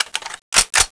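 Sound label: pickup, weapons; SINDEMO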